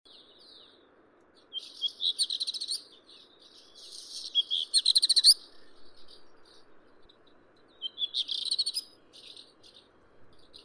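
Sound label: black redstart, phoenicurus ochruros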